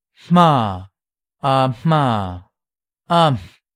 Voiceless Bilabial Nasal

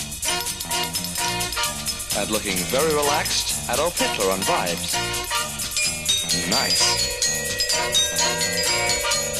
vibes

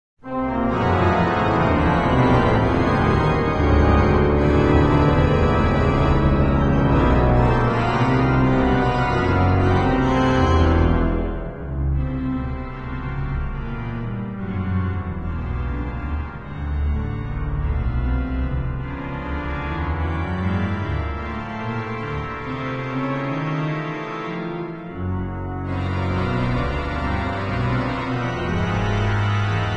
organ symphony; Sorabji

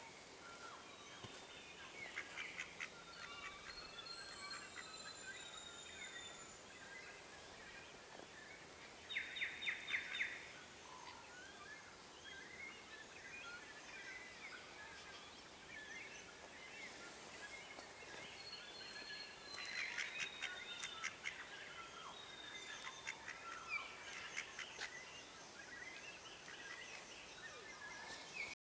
Male ducks do quack

